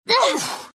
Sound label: Player Dodge; Blossom; The Powerpuff Girls - Mojo Mayhem; NextlevelGames